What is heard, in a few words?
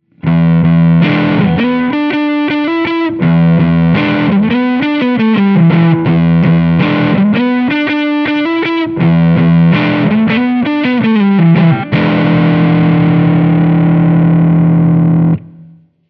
crunch neck series, drive – neck-PU in series; Manne Ventura